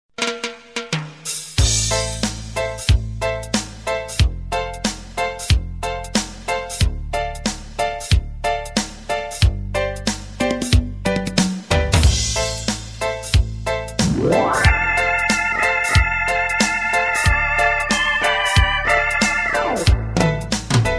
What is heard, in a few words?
Melody